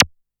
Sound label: Ring Blipp, FX Hit; Drums; Ableton Live 12 Lite; Core Library